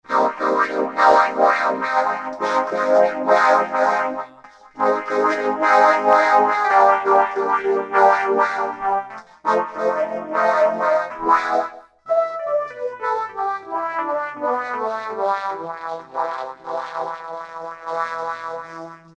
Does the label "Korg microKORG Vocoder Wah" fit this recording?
Yes